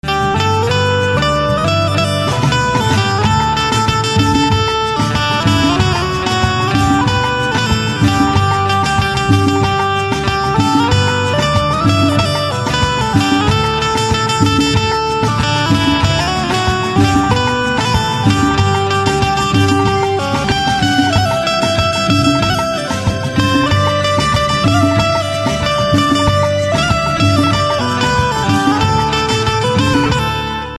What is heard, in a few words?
Banjo Instrumental Ringtone